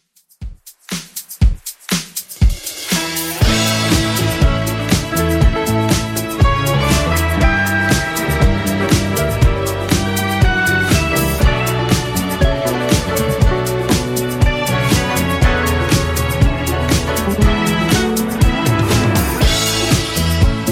70s Redrum 120bpm

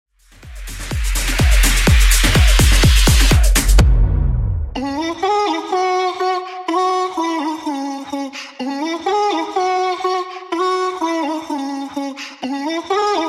Bootleg House 125bpm CLEAN

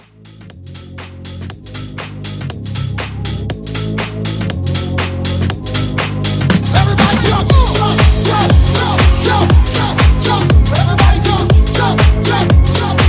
house, 120; House Kool Edit

